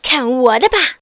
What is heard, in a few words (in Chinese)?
女入场; renlei